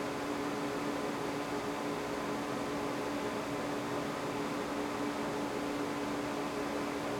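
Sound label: environment Servers-Fan Close Loop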